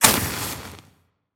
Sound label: the tick burn